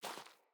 Boot Walk Gravel, Footsteps; Characters; Enemy; Boots; Sinecure; Melee Grunt

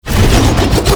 Spin Attack Start; Warframe; Veilbreaker; Sprag

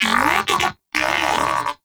Moa Pet Tenacious Battle End; Pets; Warframe

